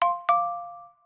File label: surprise, notifications, OnePlus 5T Stock Ringtones